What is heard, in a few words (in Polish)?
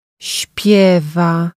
spiewa